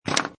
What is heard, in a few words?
Pinball Sound Rattle And Clank; Video Games